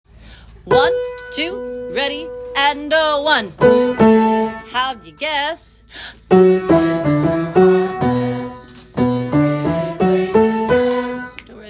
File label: Alto Bass; Mean Girls; Rehearsal Recordings